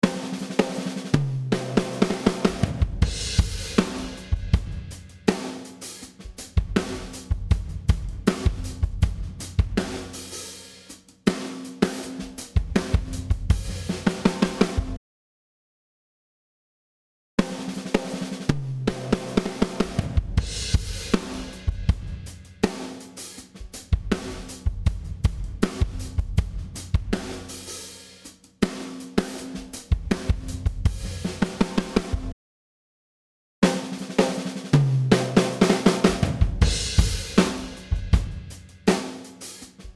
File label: PLATE SMALL ROOM